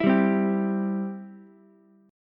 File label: C Major, C, down, Ukulele; C strummed